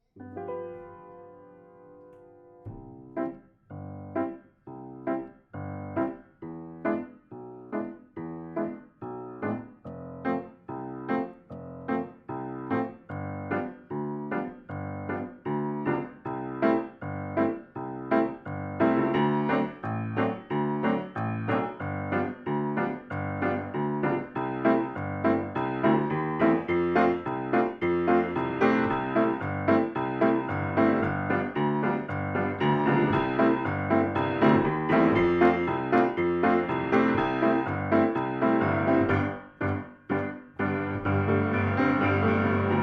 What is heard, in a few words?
Instrumental; theater